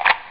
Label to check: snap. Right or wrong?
Right